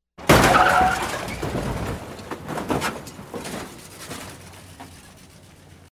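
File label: wheel spinning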